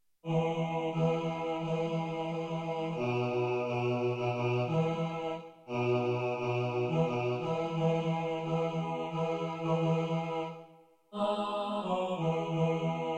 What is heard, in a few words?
gifts bass, choir